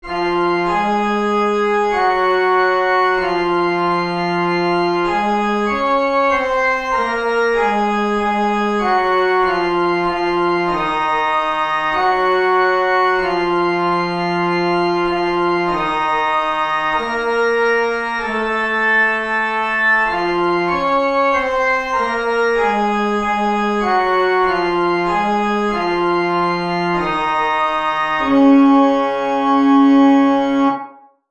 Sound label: Melodic Dictation; Level 8